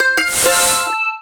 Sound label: Boss Hit